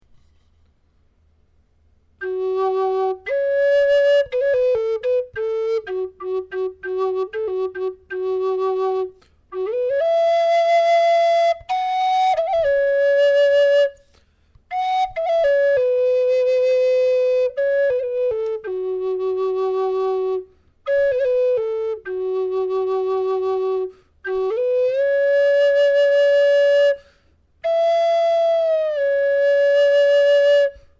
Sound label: improv, flute